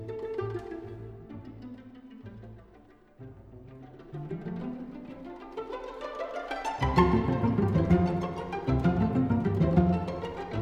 Playful Pizzicato; Simple Symphony Op 4 II

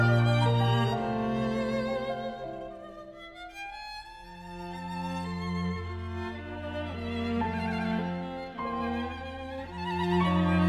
Piano Quintet in A Major Andante Live; Piano Quintet Op The Trout II

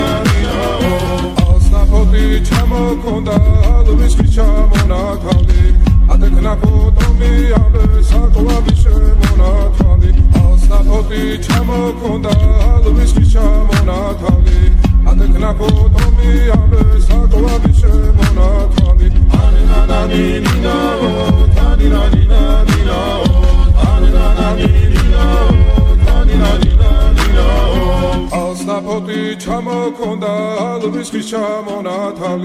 Trap Remix Georgian Folk

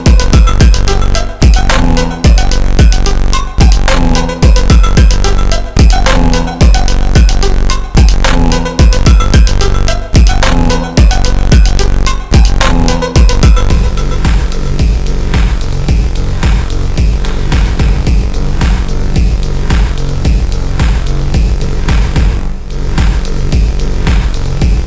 Static slowed

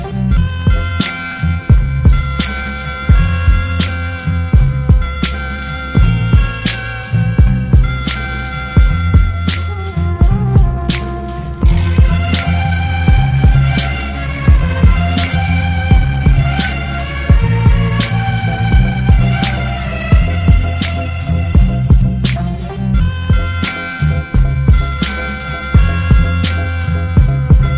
Slowed Reverb

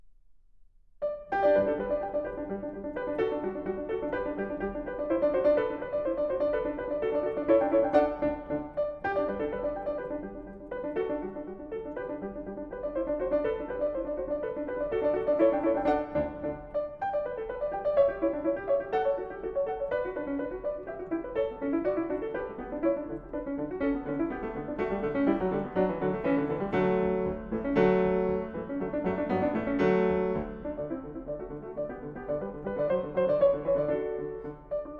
for piano solo; Holberg Suite Op 40 V Rigaudon

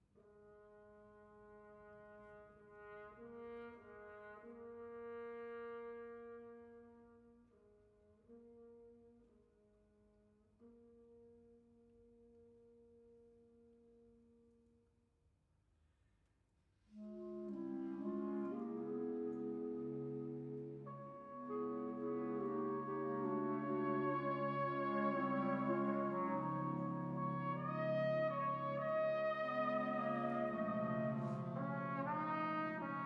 Piano Concerto in F Major Andante con moto; II. Andante con moto; Freddy Kempf

